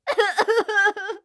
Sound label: voice Cry